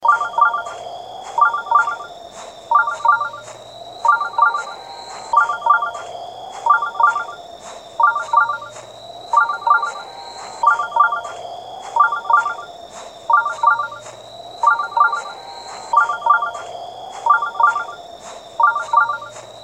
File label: Shrine Ringtone